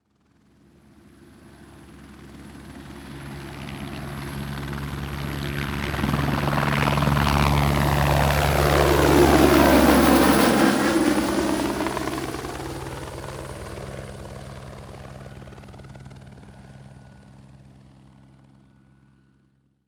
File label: Helicopter Fly By, A helicopter pass by at medium speed; Aircraft sounds; Helicopter Sound Effects